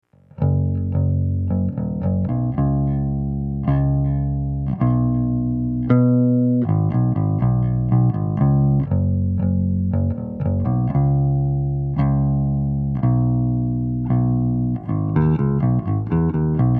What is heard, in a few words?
Bass Knockin